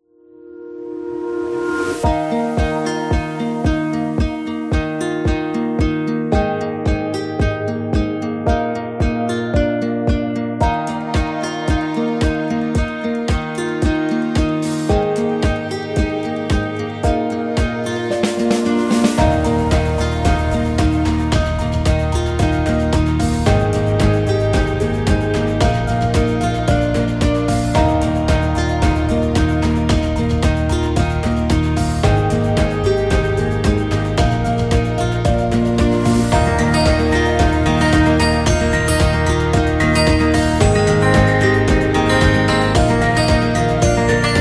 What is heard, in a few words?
The Guitar; medical background